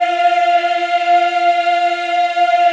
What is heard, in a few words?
F4, Choir Acoustic & Synth; USB Soundscan vol.28; AKAI